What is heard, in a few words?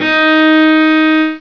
violoncello